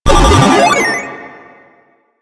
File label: Proto Infested Mutalist Speaker End Scream; Warframe; Abilities